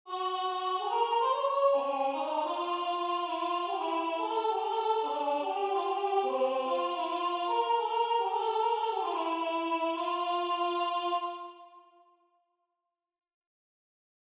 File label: Gloria Alto; Domine Fili; Vivaldi; Antonio Vivaldi